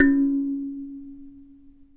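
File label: C#3 kalimba right; WorldSounds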